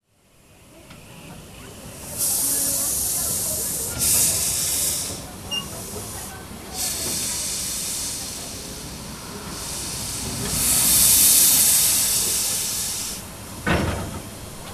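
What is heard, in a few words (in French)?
Attelage locomotive, train à vapeur; MZ-N710; CFHR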